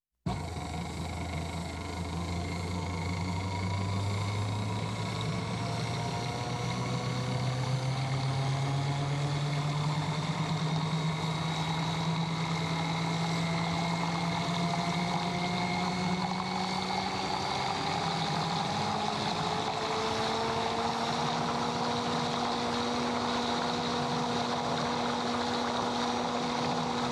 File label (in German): Mixer; Haushalt